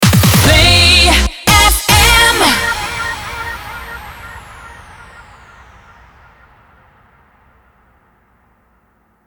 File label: TOH 145bpm Bb min MIX A Outro; PlayFM